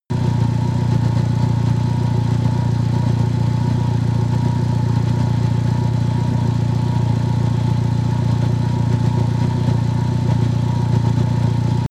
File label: engine damaged idle